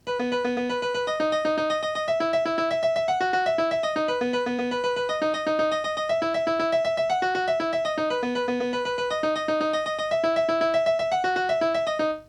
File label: Piano Rythms